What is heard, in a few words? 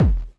BD; Best Service; Dance Mega Drums